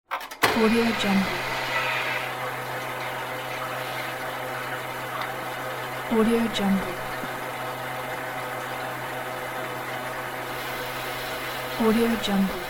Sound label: industrial machine sounds